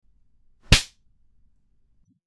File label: Smack; Sound FX